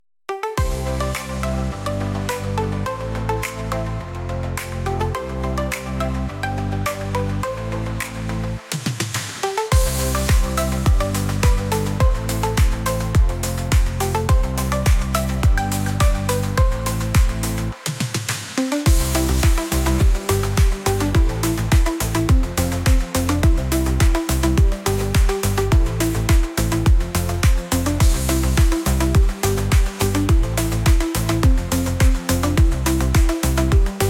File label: Techno Triumph